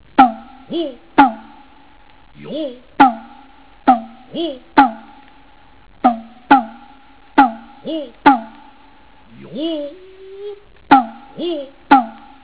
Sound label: tsuzumi, Kabuki; Japan